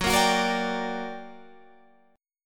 G Minor Major 7th, Gb, guitar chord

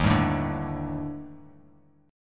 D sharp Major 7th Flat 5th, down, Bass Standard; strummed; JGuitar

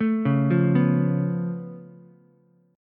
C sharp Augmented 7th, C sharp, down, Ukulele; C#+7; arpeggiated; JGuitar

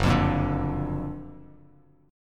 F sharp Augmented Major 7th F sharp Bass Standard; strummed

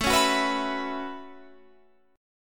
F sharp Minor 11th

